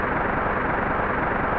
lg hum, lightning; weapons

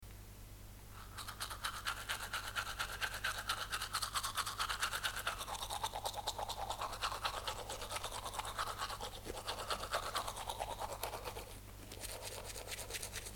Brushing Ones Teeth

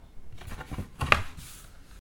picking something up

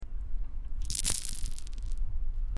pebbles on concrete

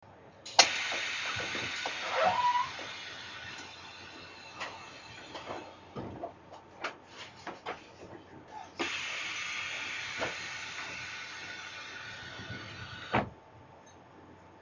train automatic inner door opening closing

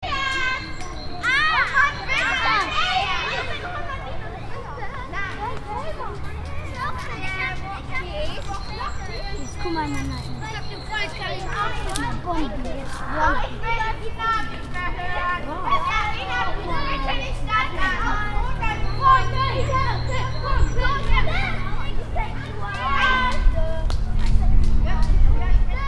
douzen kids on playground aggresive topics

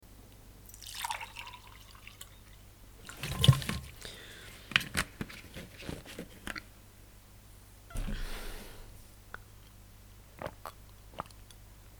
pouring water in glass and drinking

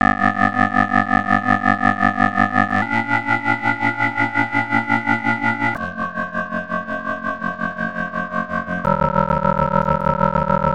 wave melody

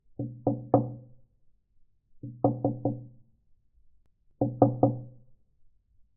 knock knock

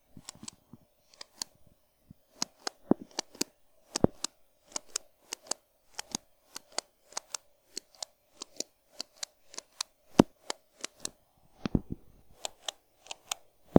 pen clicks

